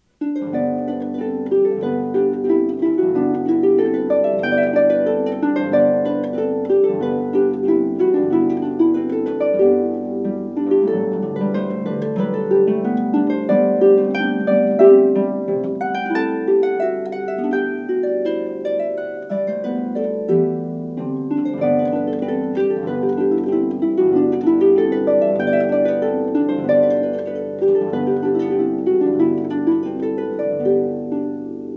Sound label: gavotte